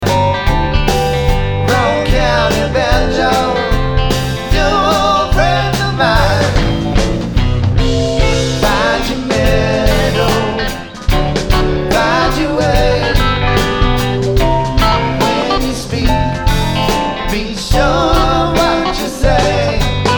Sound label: Banjo